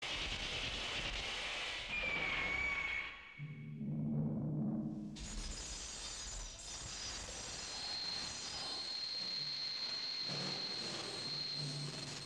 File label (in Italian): illanguidirsi del suono della campana